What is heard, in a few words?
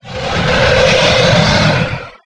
nemesis pain; cstrike; zombie plague